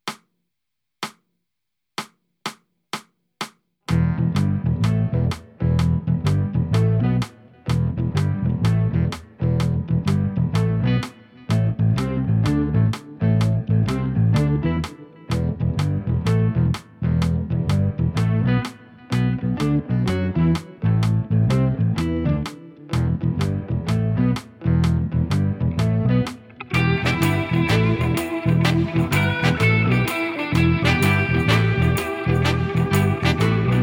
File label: Backing with click